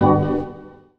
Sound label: chords